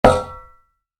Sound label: Shovel, Impact; Dull Hit; Yard Tools; Yard Tool Sound Effects; Yard Tools (Sound Effects:Tools); Stuart Duffield